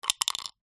leisure dice into plastic shaker

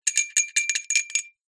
foley small stone in small metal tube shake; zapsplat

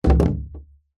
foley wood panel thin plywood set down on edge; zapsplat

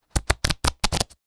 money notes bills wad flick through; zapsplat

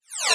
multimedia max min interface sound sci fi min; sound effects one

